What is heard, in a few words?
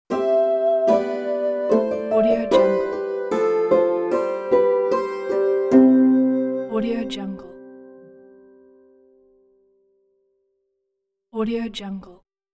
medieval story transition